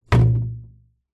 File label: musical drum tom set down